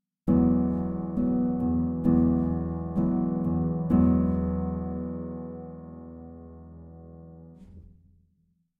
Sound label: Piano dry; 钢琴干声; Una Corda